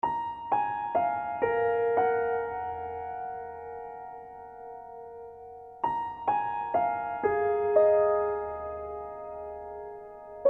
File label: Piano